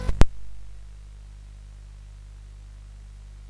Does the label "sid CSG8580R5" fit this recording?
Yes